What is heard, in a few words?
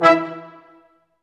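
BRS Large Ensemble stac; Roland L-CD702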